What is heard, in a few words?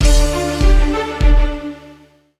start; Fire Queen